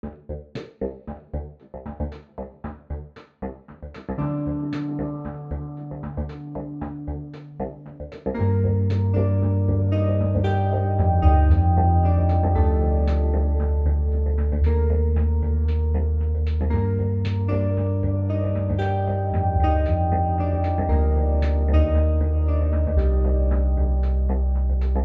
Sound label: arp